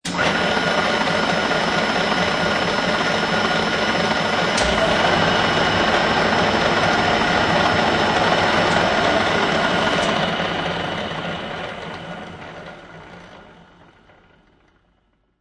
Mill Type, Industrial mill, Start up, run, stop, wind down; Mill type 2; Machines